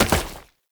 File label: Step Flesh, Foley; Warframe; Oraxia